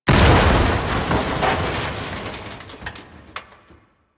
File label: Destruction Explosions Two; Ambience; Warframe; Corpus Railjack